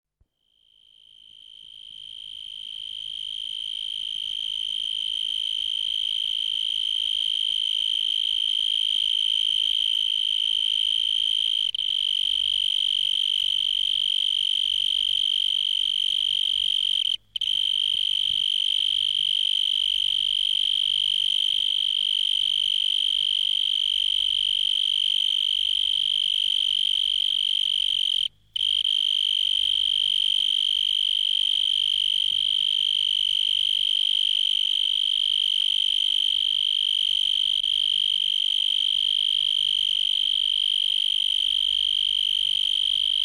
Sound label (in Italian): insetti insect; animali